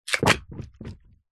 foley balloon filled water small drop carpet bounce; zapsplat